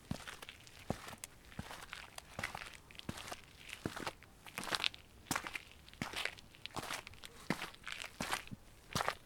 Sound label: Step Asphalt